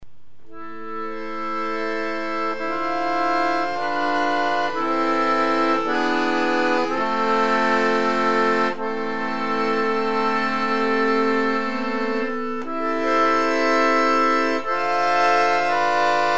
sarabande

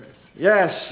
sample, male laugh

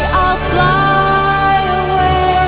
female laugh

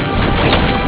laser gun

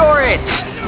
laser gun

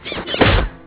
laser gun

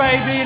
close door

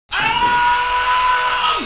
alarm

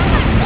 close door

